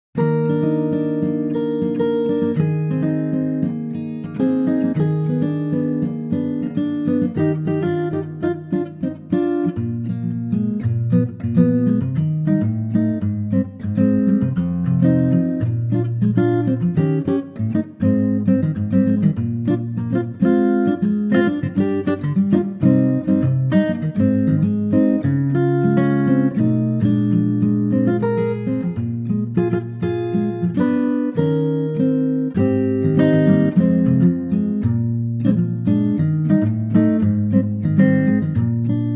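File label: Eb acoustic guitar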